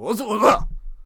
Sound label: Monk Attack Vocals